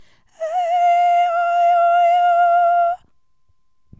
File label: aeiou High